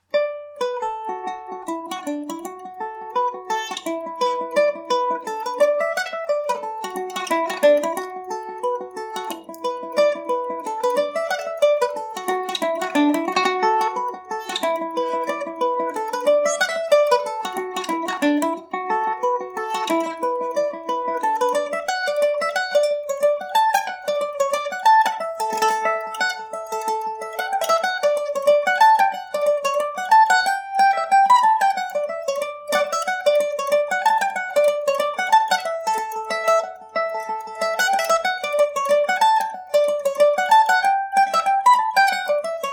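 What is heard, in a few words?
D major md304, Irish mandolin; jackie colemans revisited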